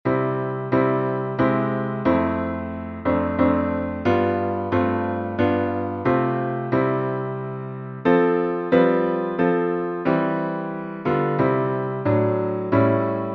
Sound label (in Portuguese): salmo instrumental